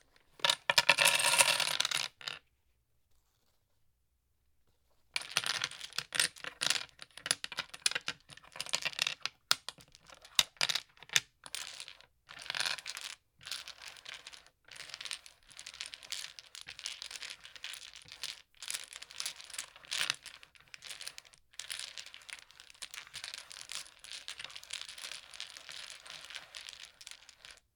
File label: Domino Setup